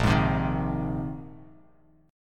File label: A sharp 9th Suspended 4th A sharp, Bass Standard; strummed; 6,11,10,8; chord; JGuitar